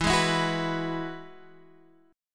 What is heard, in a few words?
Gb 7th Suspended 2nd Suspended 4th